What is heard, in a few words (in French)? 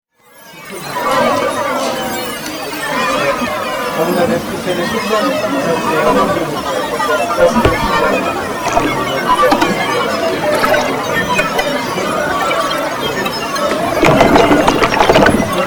eau voix; residence MDQ